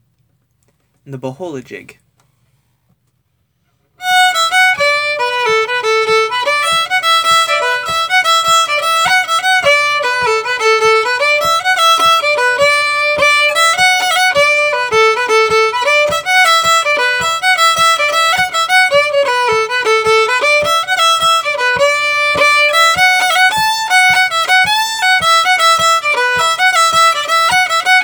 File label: Fiddle, Jig Faster